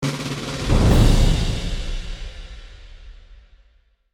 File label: Combat Stinger